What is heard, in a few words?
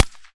damage; ludumdare40